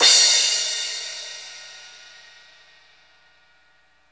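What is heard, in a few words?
cymbal crash, Roland; Club-50 - Foundations Roland; Ext.Snare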